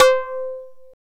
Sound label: claves